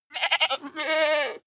goat baa two

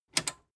metal button tiny click enable fast

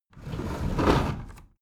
window roller shutter close soft single pull

